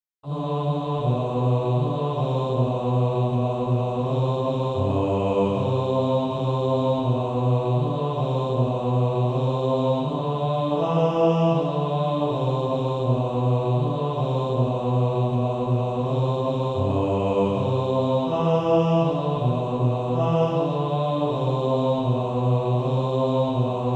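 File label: Male Choir, Practise Music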